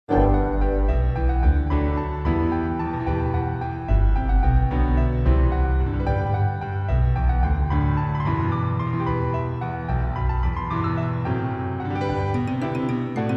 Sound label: piano conc 1st movt